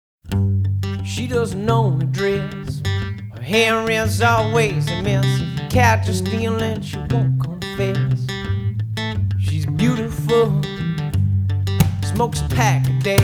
acoustic version

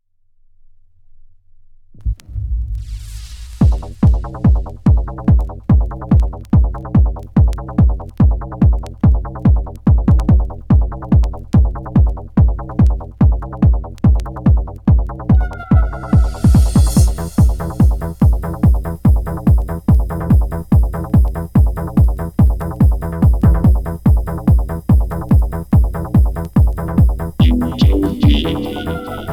techno dub

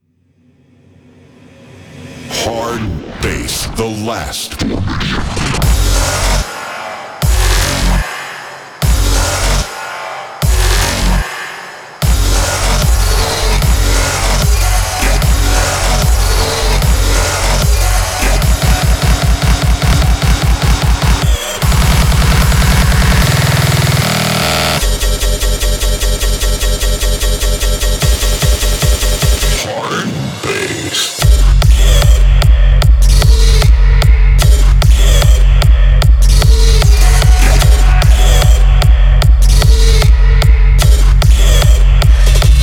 hard bass anthem

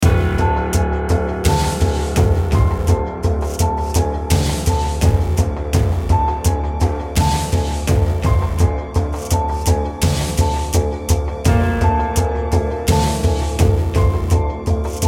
drums

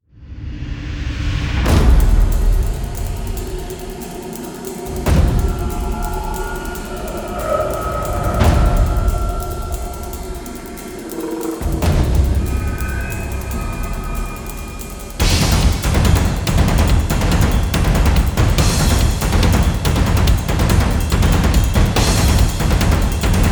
drums

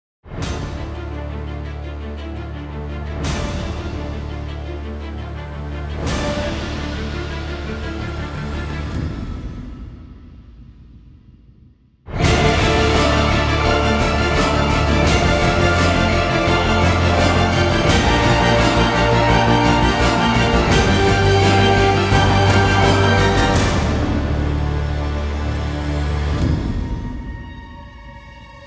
trailer version

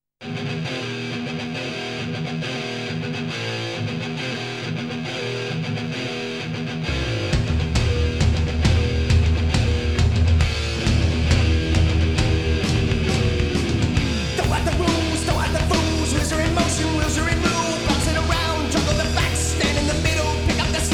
march

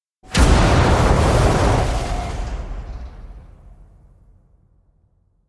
destruction slams twisted metal

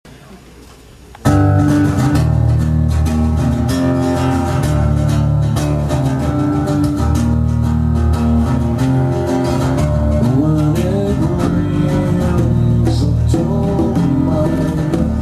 rain song acoustic

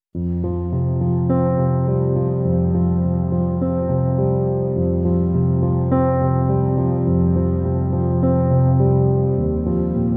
piano

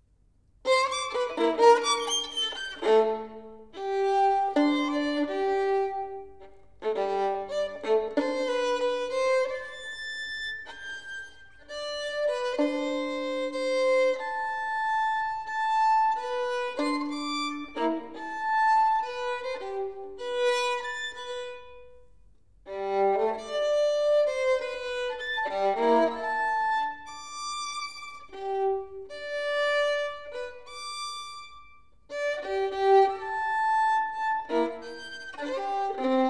six melodies variation for solo violin; christian wolff

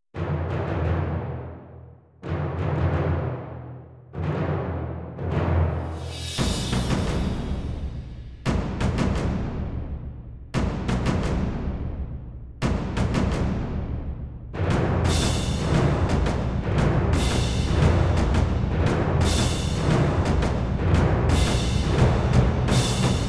carol of the bells percussion only

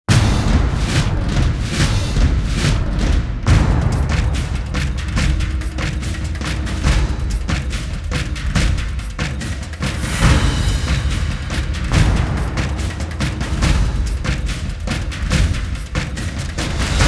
drums

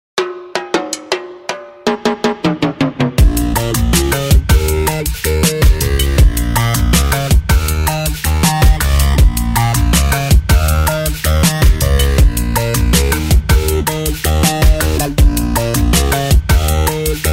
instrumental

